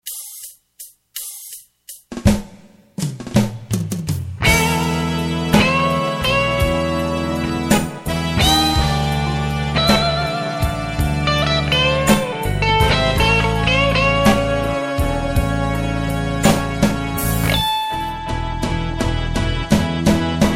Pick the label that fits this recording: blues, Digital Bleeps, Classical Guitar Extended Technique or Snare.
blues